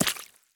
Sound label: run puddle; Escape from Tarkov